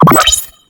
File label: display